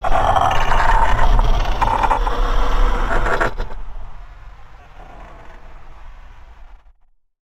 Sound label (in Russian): inoplanetnaya forma zhizni